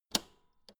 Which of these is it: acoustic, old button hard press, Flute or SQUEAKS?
old button hard press